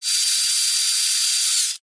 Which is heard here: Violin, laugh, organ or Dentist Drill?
Dentist Drill